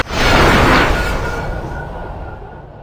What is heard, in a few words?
dash strike; LoL; yasuo base